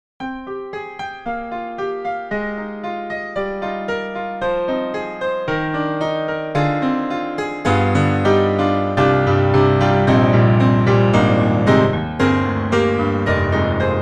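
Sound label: Truepianos Sapphire pedal Module Classical; 4Front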